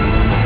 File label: close door